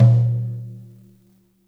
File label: floor tom - snares on; JazzFunkKit